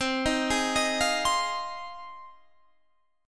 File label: Ab 6th C